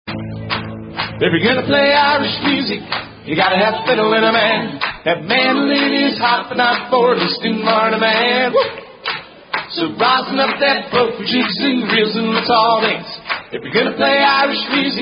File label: Fiddle In The Band